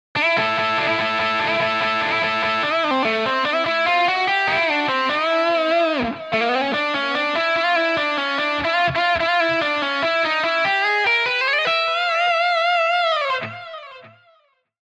guitar pattern 2 gt

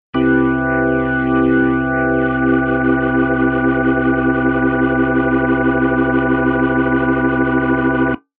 Keyboard Organ ROTATOR

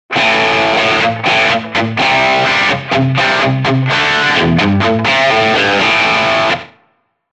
guitar

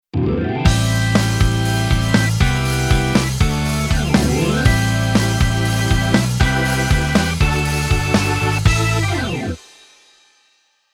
Keyboard Organ Rock

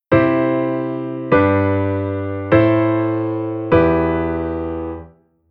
Chord

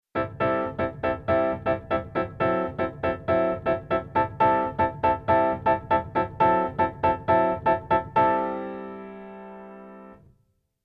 Keyboard Pf Bossa Pf

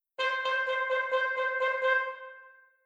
stacc

